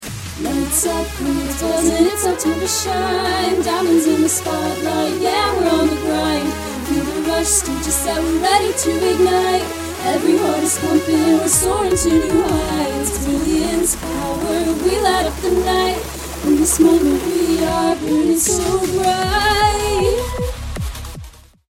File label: Sample, female singers